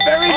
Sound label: win